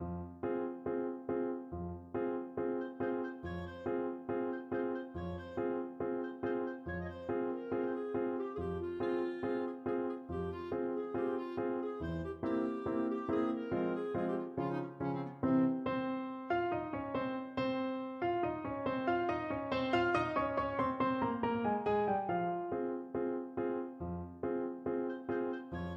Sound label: overture clarinet; barber seville